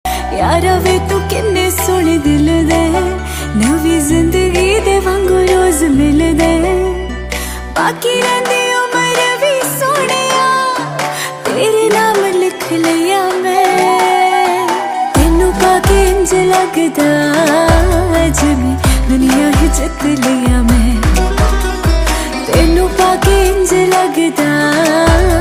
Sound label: Punjabi Ringtone